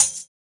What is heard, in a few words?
Closed Hat, JUNGLE BREAKS